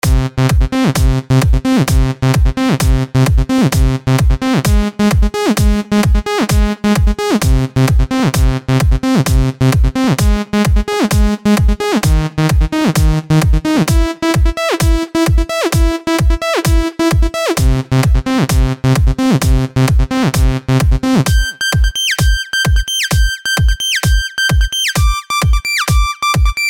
electro house

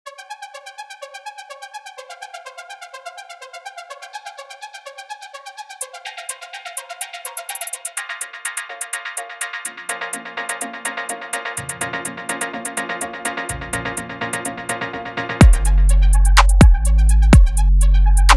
lite piano; Mizhory

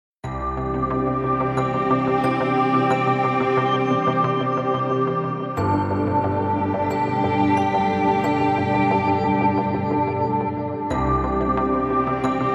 Relaxing Ambient Relax Music

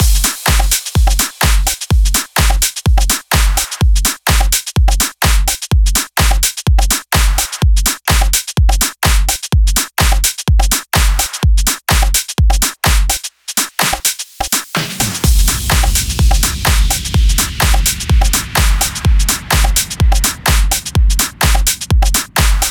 FULL CLUB BIT 126 BPM

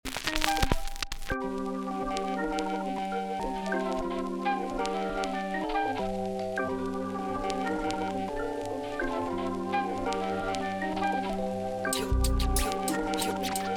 Instrumental; MeHighLove

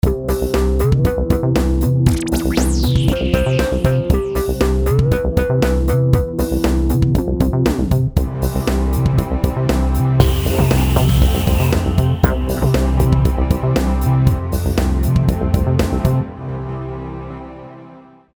Jingle On TV

